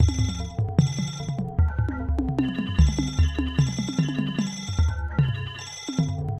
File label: Cartoon; Moonlight Tower D